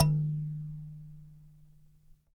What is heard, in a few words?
Dyna Sansa; Roland LCDP11 Africa VOL-1; Sansas